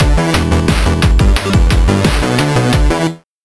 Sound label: guitar 8bit remix; payday2